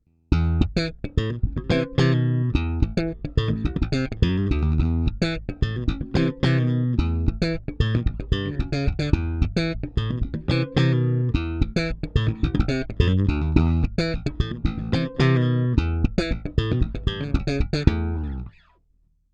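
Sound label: Both active Slap, bass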